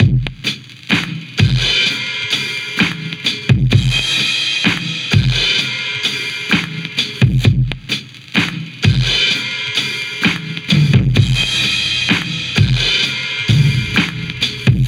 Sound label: Drum Break, Trip-Hop Loops; Sonic Foundry; Rondo Brothers Trip-Hop Loops & Samples; Hooked On Hookers